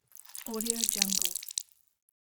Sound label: wet rag wring